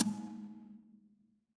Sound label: magic scifi shield